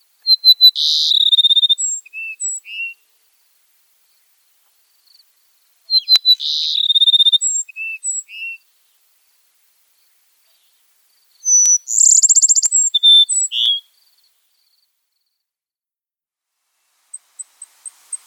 Cassins Sparrow

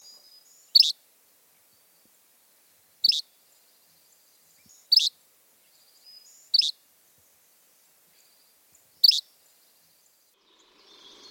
Yellow bellied Flycatcher

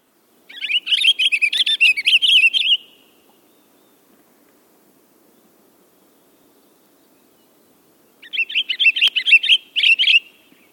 Pine Grosbeak

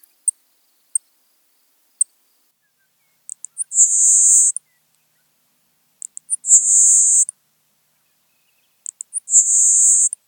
LeContes Sparrow

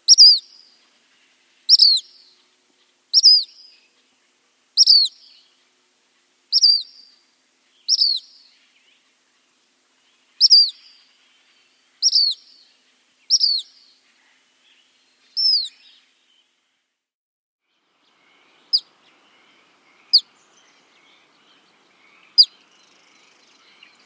Black Phoebe

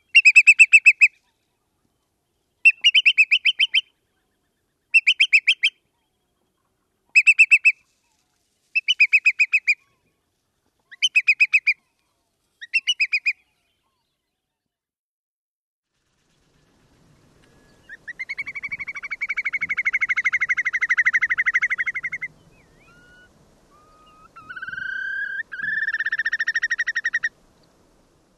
Whimbrel

